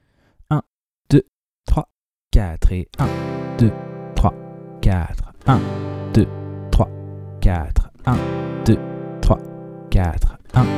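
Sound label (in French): rapide Passer de C à G, suites d'accords; De 0 à vos premières suites d'accords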